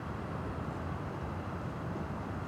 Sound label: Ambi Loop, outdoor samples Ambience Loops